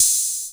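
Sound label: groove open hi hat; USB Soundscan vol.11; Drums Dance & Groove; AKAI; GROOV KIT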